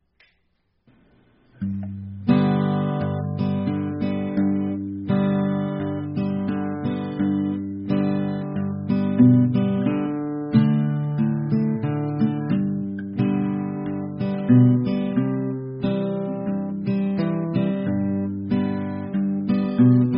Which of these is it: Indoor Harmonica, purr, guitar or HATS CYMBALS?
guitar